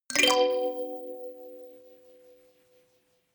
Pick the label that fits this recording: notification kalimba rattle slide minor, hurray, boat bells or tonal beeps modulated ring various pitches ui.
notification kalimba rattle slide minor